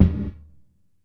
Gated Tom; Univerb; creative gate; Gated Reverb

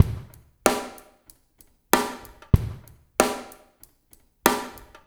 DRUMS, Liquid Grooves; Spectrasonics

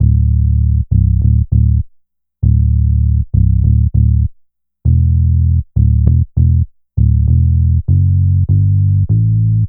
Synth Bass; Bass X

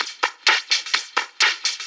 FX Loop 128 BPM, House

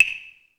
AMB P, HALL KITS; Crypton - DrumBase5000

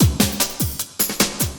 LOOP, Drum & Bass, 150 BPM

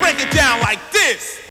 VOX; Zero G; Man Machine

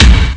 drum; Amiga Soundtracker Sample Packs

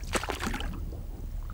ROW, Boat Rowing

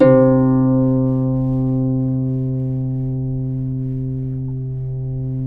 CEL. HARP C2; USB Soundscan vol.23; Historical Instruments; AKAI

